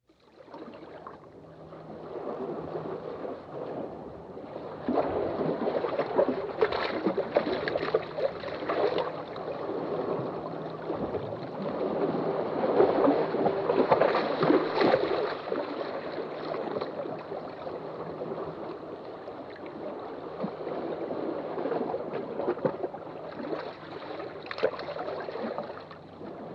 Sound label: Lapping Waves